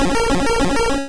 Lick

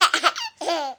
Baby

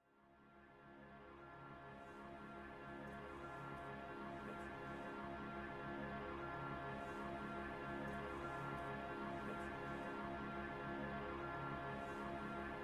Interrogation Ambience; l.a. noire the lost tracks

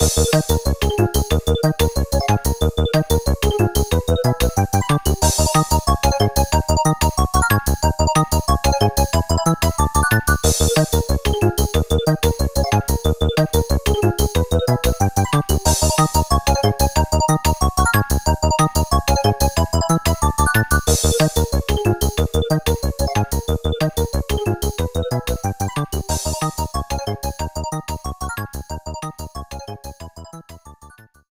roulette; Yo-Kai Watch 3; 3DS

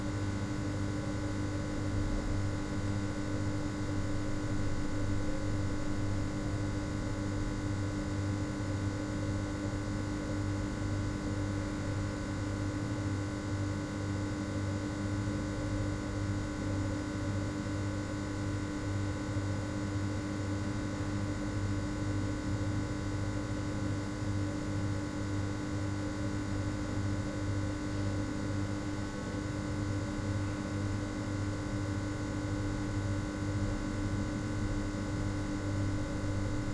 Neon Lamps Ambience; blood waves

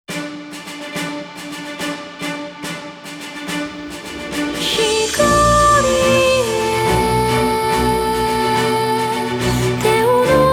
Song Japanese; fire emblem fates if; Light Song

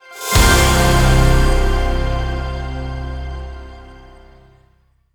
jingles; Virtua Quest

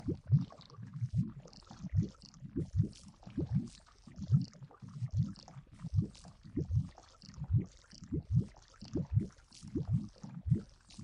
Slime Idle; Lethal Company; Windows

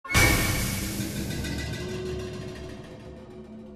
SHOCK; F.E.A.R. Perseus Mandate

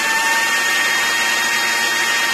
OnAttack; guns n zombies 2014 pc

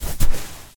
footstep sand walk; MONO; ProSoundCollection v1 3

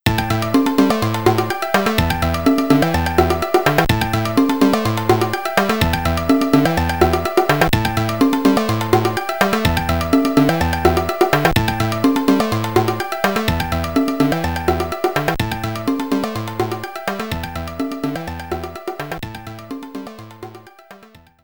Story Sequence; Fightin' Spirit; cd32

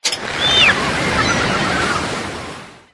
City Ship

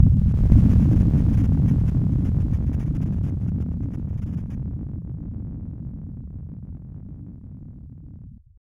Distant Explosion; Grounseed; OPNA ver.; PC-98

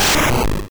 Rock Throw; sfx; attack moves; pokemon; gen 1; rby

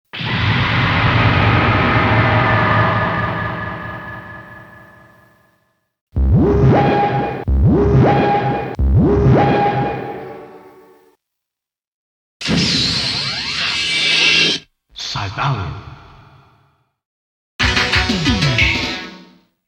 Hardware Initialize ~ Notice ~ Title ~ Coin; Night Striker Syvalion Perfect Box

